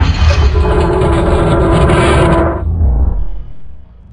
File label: metal big impact scrape; hl2; canal